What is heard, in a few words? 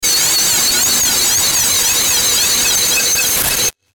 static bright; Static Bright Feedback Squeal